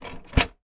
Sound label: office printer scanner lid close epson stylus sx235w; sound effects one